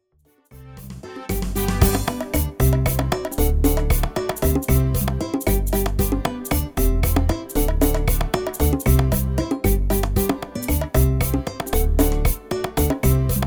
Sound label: Bongos